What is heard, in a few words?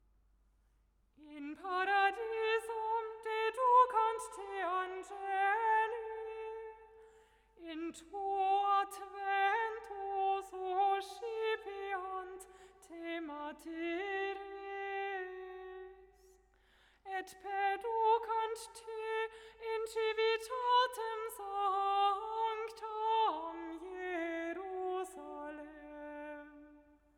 mictest vocal, microphone comparison cardioid